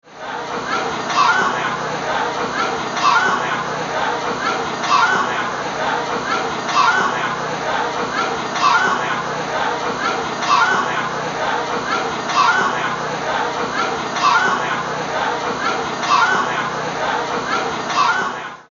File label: Achoo